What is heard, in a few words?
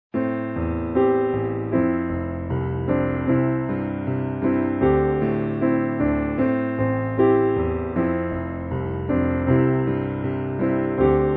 Piano Version; Silent Hill 2